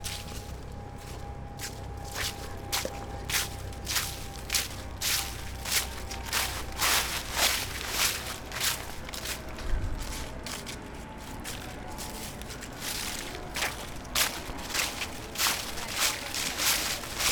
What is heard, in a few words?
Leaves With Plane